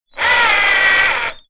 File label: struggling servo movement; sound ideas